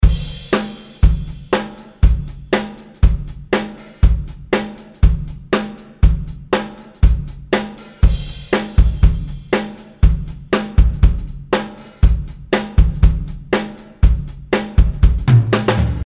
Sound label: Drums